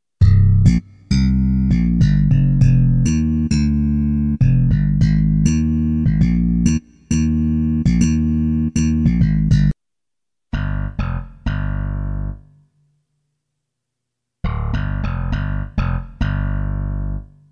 hip hop bass

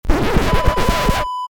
record scratch

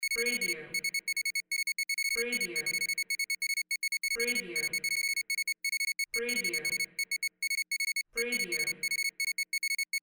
type effect beeps

